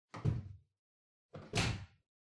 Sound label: Washer Front Load Far Door Close